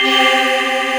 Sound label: chord, synthesizer; AKAI S6000 CD-ROM - Volume 1; HOUCEY CHORD